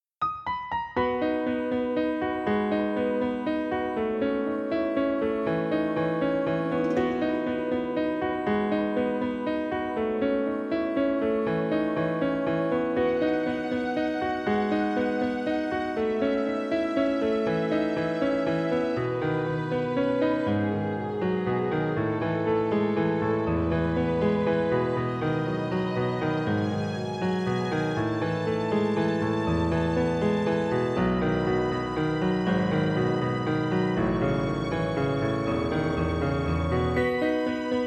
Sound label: Ballad, Instrumental Cover